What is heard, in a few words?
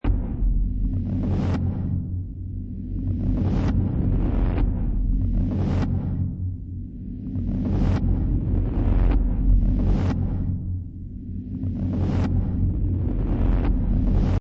Dark Tesla Reactor Loop, Dark energy field, powerful electrostatic; Dark Tesla Reactor: Dark energy field, powerful electrostatic fluctuations.; Science Fiction Sound Effects